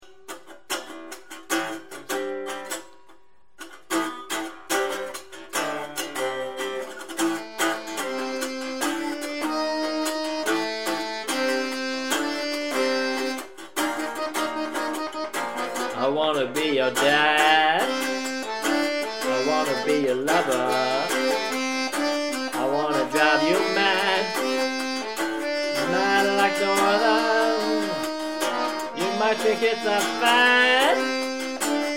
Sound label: acoustique; acoustic setup